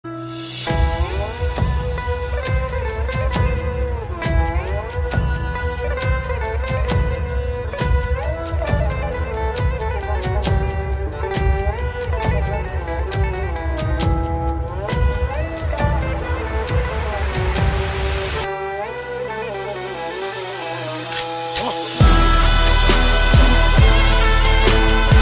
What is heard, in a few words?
osman sad bgm music; kurulus osman